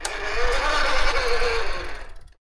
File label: Toy car releasing after wind up clattering